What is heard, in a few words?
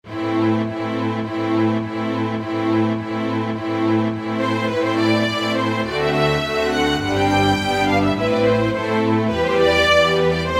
romanian folkdance, string ensemble; bartok